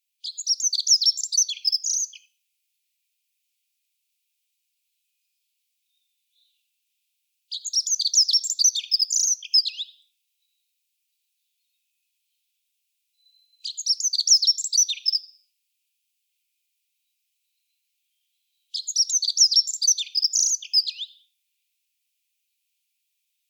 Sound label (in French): chant accenteur mouchet; oiseaux